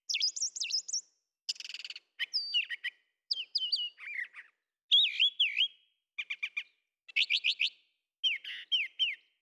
animal loop

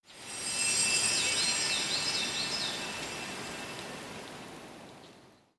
sandbox station

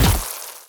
imp smoke crutch

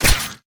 short sword

